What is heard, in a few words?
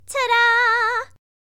Tada; Hilzartov; Fairy Size Doesn't Matter